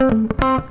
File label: FUNKY, Grooves